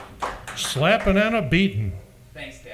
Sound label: SLAP BEAT